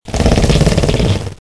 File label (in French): grognement; Predator Mod